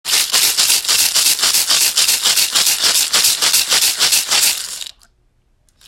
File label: Lapis Moonstone rattle; intention instruments